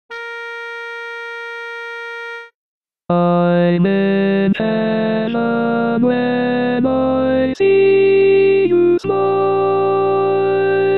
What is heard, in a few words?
tenor, barbershop; teach tracks